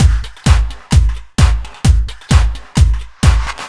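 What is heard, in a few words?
No Percussion, Drum Loops 130bpm; Zero-G; Soundclash Nu Dance Electro; Acidized Wavs; Klub 2 Death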